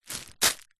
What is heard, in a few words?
foley packet plastic tightly wrapped small rubber items catch hand